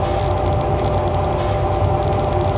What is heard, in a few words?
generator; XDM; ambience